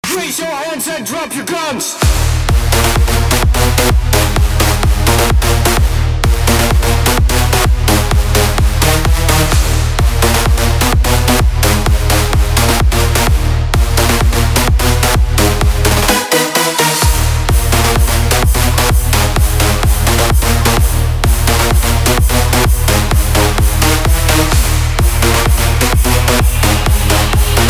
BASS party TRAP DUBSTEP

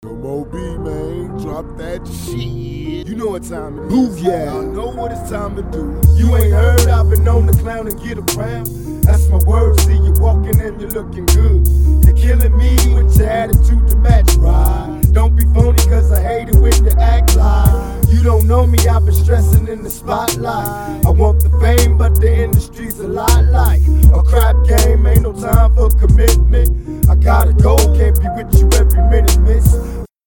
Beat

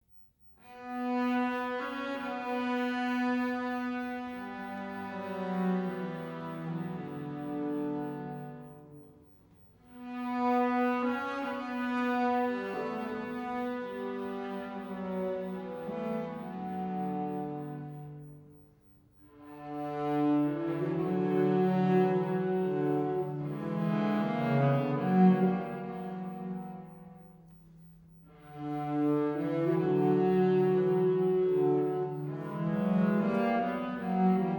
String Trio

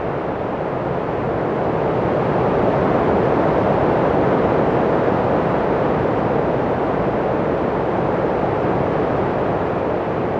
Relaxing Circular Waves of White Noise

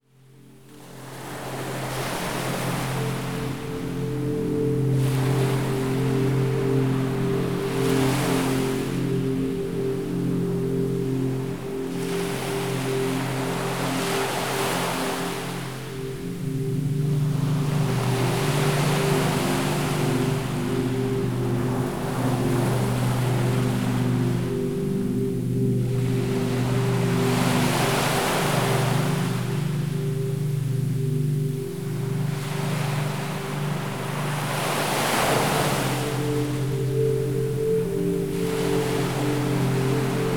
Calm Sea Sounds Ocean

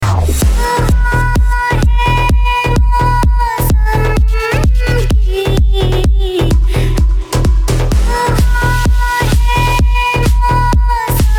ringtone

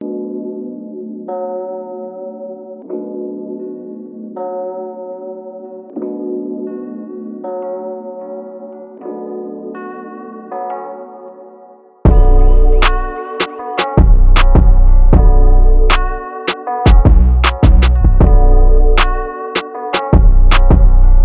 Bass Boosted

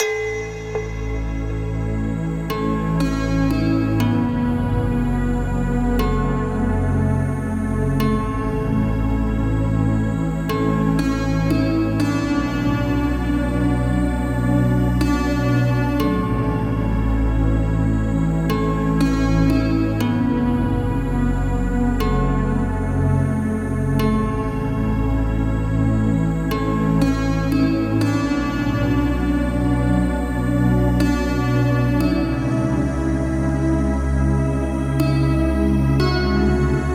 Binaural Beats Meditation; Ajna third eye chakra

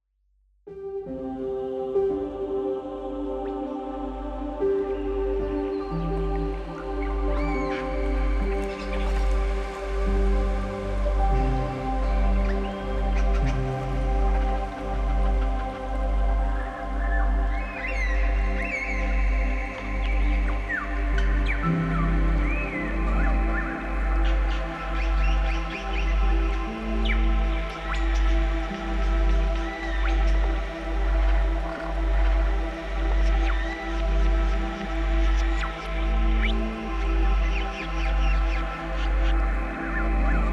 Soothing Beach Ambience; Nature and Rain

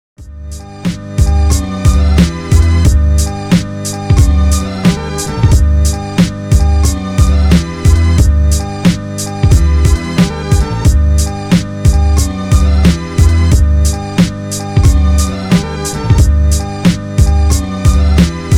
beat

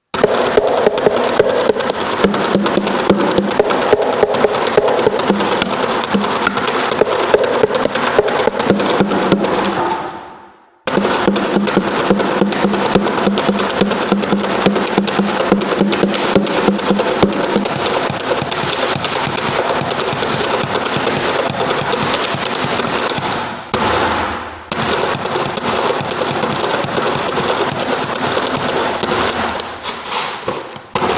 Crazy African Drums

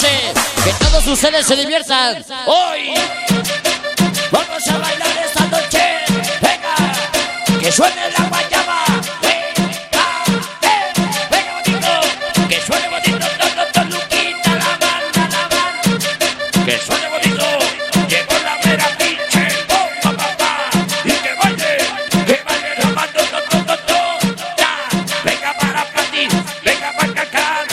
Cumbia

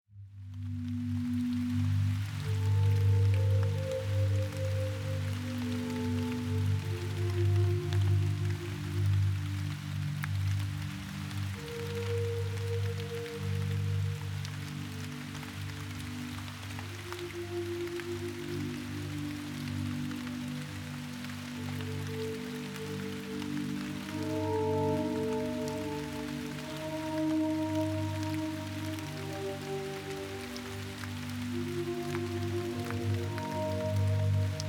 Study Piano Music Rain

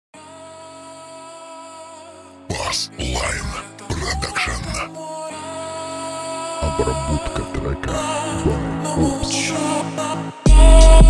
39 41 44Hz Low bass

